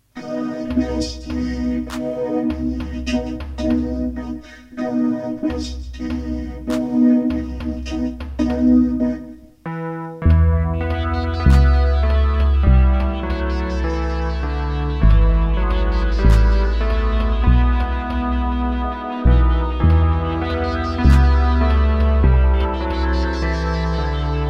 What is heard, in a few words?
god rest ye merry gentlemen synth